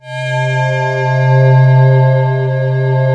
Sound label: YS FOG C3, SYNTH; Q Up Arts; Dream Experience